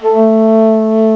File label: FLUTE, WIND